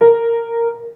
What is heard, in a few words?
low A sharp